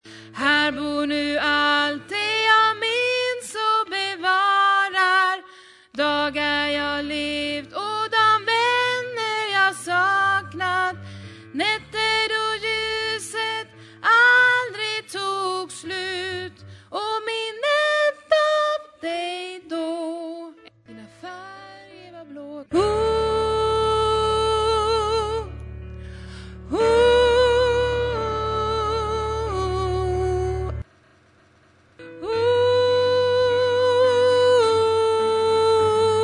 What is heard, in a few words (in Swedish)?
bas, stammor